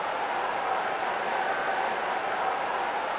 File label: base crowd; MX Unleashed